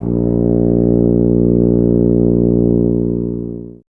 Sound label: BRS TUBA, Solo Instruments